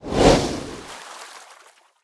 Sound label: Shark Frenzy; Lagoon